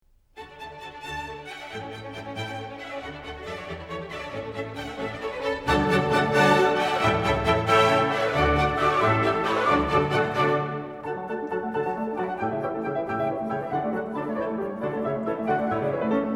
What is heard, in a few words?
Allegro; klassik; Mozart; Mozart The Great Piano Concertos; Rondo in D, KV. 382